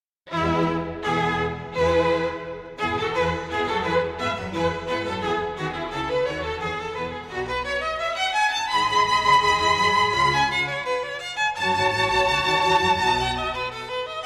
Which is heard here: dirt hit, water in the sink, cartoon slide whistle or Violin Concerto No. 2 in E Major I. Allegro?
Violin Concerto No. 2 in E Major I. Allegro